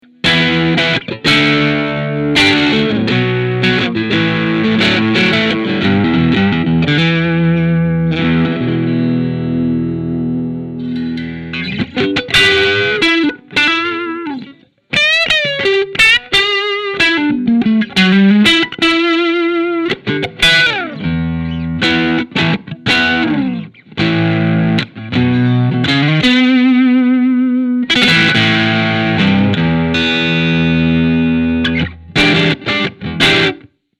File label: Germanium Strat; IT-11; Germanium Hazard